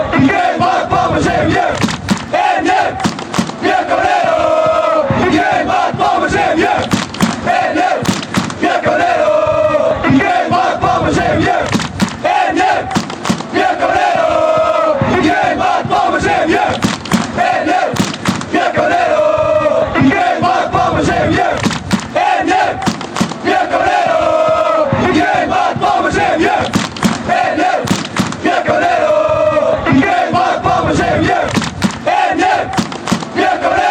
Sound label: chant, fanchants; portimonense